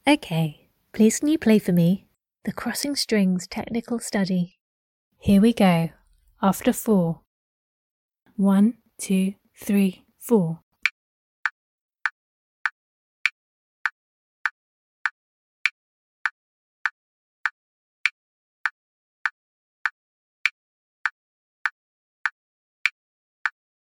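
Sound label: Crossing strings technical study, Guitar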